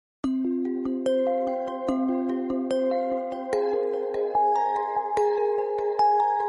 most melodious message tone ringtone, sms ringtones